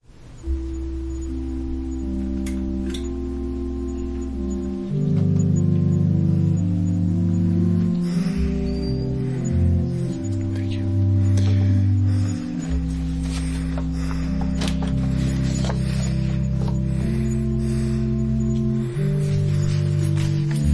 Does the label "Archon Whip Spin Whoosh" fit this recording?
No